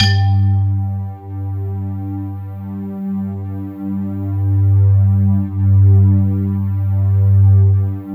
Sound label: PERCP, PERC PAD; Sound Gallery - Charged